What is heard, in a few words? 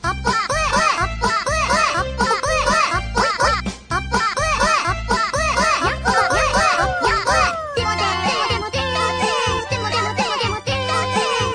Cute Anime